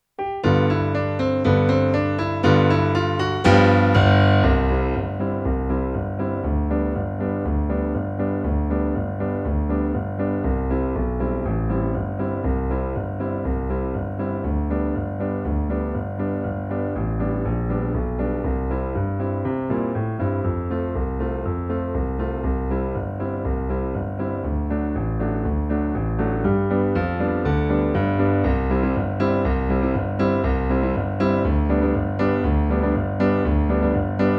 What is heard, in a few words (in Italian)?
base piano